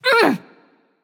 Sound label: grunt hit; smite; amaterasu